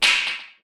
broom drop

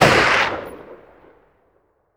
hunting rifle, sniper

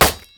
sword impact shield

